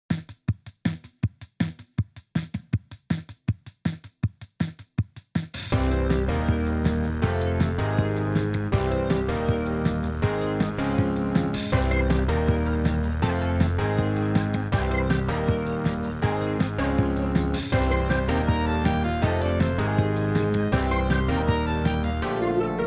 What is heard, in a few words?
digital chipmonks